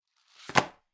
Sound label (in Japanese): ゴム手袋を置く音